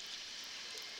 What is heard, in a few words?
Background Noise Clips